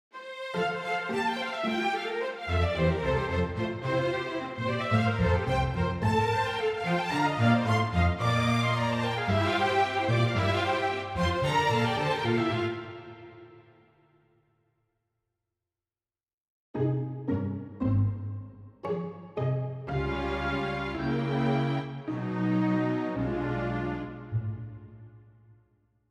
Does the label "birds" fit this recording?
No